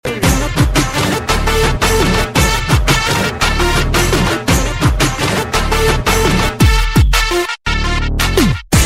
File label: Rap Beat Sms